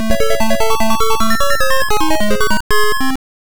Full Power-Up Intro; Star Soldier; msx

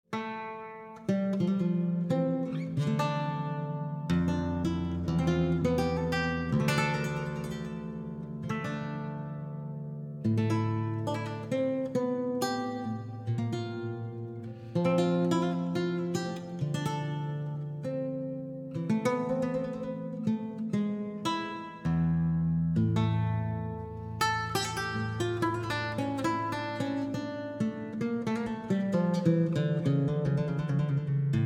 Main Theme Outro (Lute Impro); Crusader Kings III Royal Court

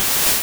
Fury Swipes

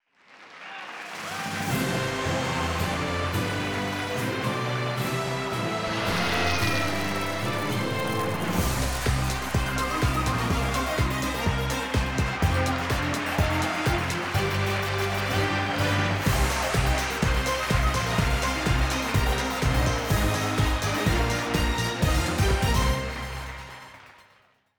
Intro Fanfare

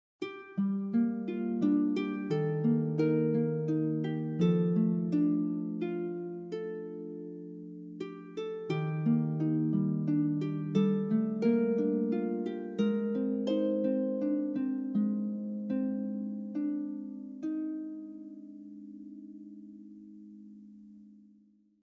Harp In Gm

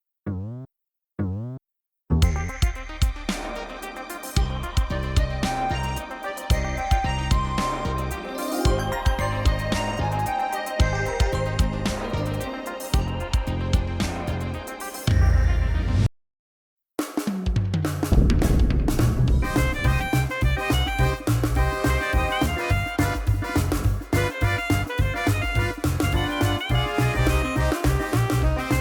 Fanfare - Dixie Beat; Donkey Kong Country 3; GBA; HD Extended Arrangements

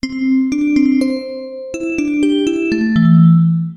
outro

